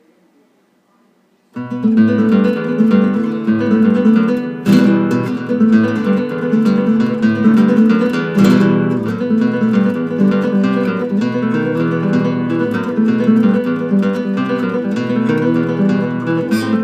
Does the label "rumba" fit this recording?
Yes